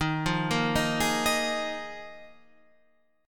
D sharp Suspended 2nd Suspended 4th, Guitar, Standard; arpeggiated; 11,8,8,8,9,11; capo=2